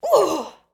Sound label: Paladin Hit Vo; Android; Portal Quest